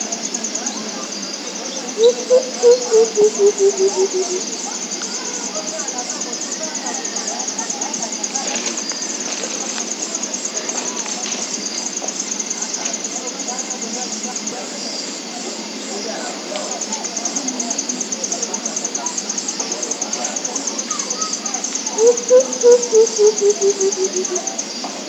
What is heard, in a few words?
Senegal coucal